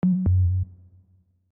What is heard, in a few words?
losing; casino; coinflip